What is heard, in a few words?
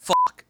hurt; rlcraft-1.12.2; playerbosses